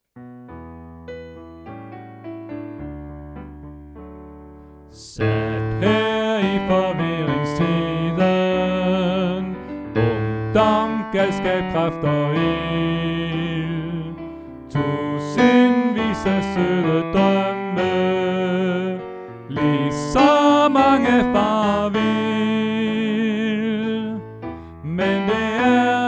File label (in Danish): Tenor; oevefiler